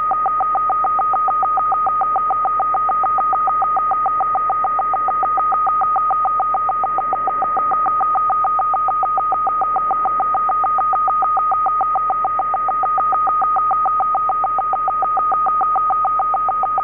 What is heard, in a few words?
CIS 14 idle; CIS-14 in idle condition on both channels; FSK-systems